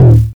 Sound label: TOM, Drums Dance & Groove